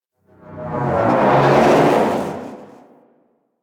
Teralyst Whoosh Step; Enemies; Steps; Warframe; Eidolon

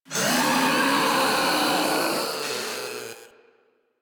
Proto Infested Ancient Death, Enemies Vocals

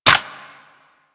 Weapon Jam Hit A; Grineer

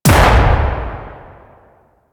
Sniper Shot; DE; Warframe; Tenno; Quad Sniper

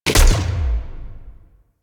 Shot, Heavy Shotgun; Weapons; Corinth Prime; Warframe; Tenno

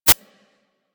UI Common Dialog Close; Warframe

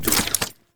Turret Tether Close; Warframe; Zariman

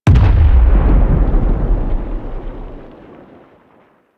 Teralyst Far Step; Enemies; Steps; Warframe; Eidolon

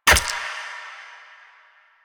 Dice Passive Slot Switch; Warframe; Koumei

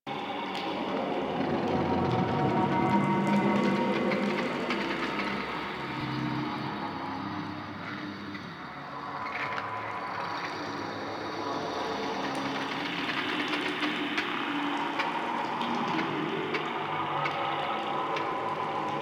Chimera Main Room Ambient Loop; Ambience; Warframe; DE

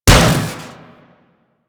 Impact Metal Heavy, Weapons; Warframe; Furax

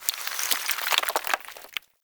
Falling Sand Rock; Ambience; Man In The Wall

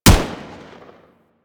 M16 Shot, rifle; Wraith

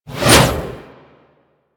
Reaper Scythe Whoosh

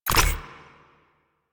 Mech Aim Stop; Nechro Mech

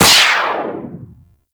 Skate Dash; Enemies; Skate Movement; Corpus Modular; Warframe; Corpus Modular Crewman

